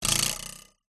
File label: cartoon spring twang bounce metal ruler twang